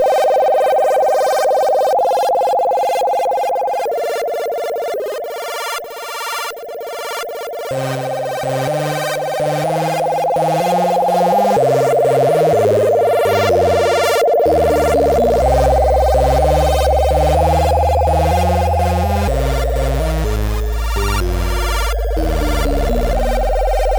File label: med synth; OctaMED MED